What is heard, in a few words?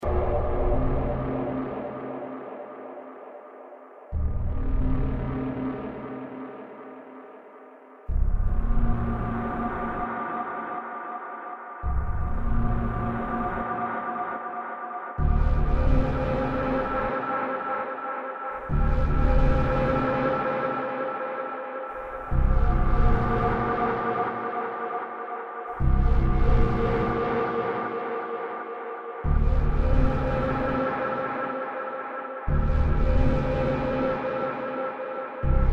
City of the Disturbed Looping